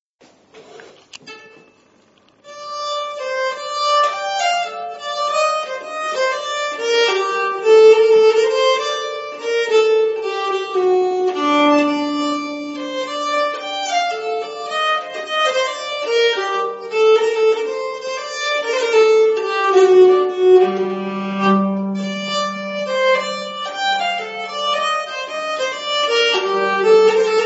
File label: Polska Lent, nyckelharpa